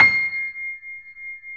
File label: C 6, Keyboards Acoustic, GRANDP ST; USB Soundscan vol.17